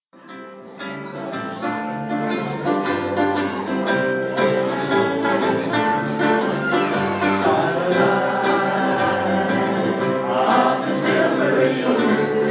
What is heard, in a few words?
ukes